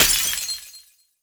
win Explode Small; netent

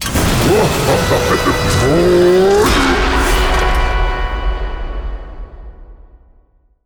genie Appears; netent

